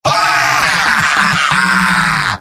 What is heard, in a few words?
laugh happy; demoman; video games; source engine; tf; mvm